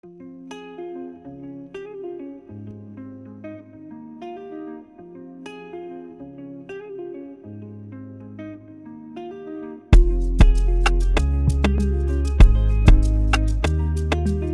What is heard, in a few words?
beat